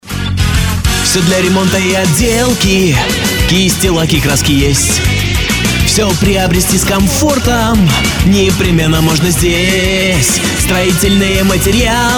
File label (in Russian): vokal